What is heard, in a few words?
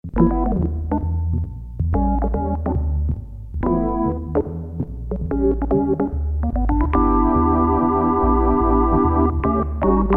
CHORUS ORGAN, Roland Jupiter-8; Factory patches